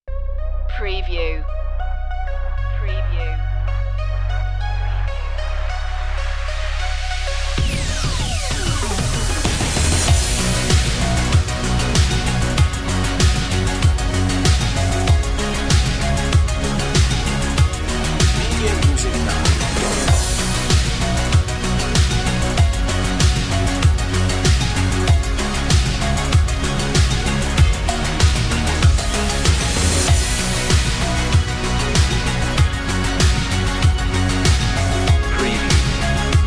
millennials less synth melodies

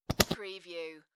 Whoopee Farting Blowing Raspberry Sound; beanstalk audio

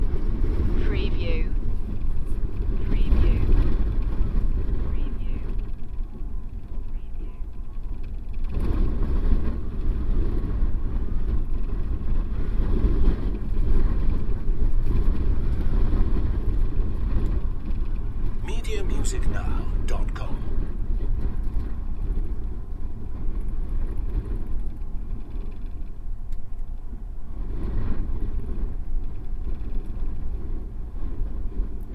Extreme wind

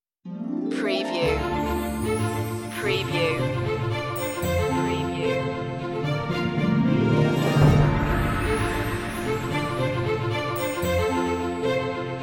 no choir version

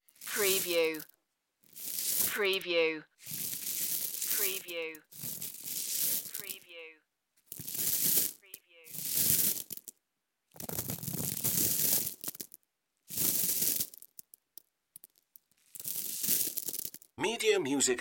dirt flaking off

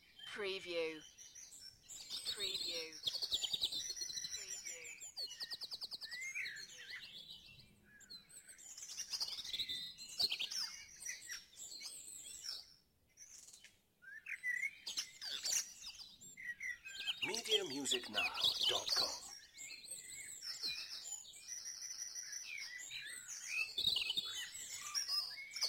Dawn Chorus in Mold North Wales

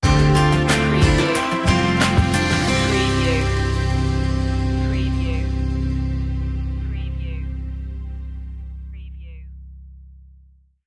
sting

